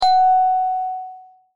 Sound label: Ding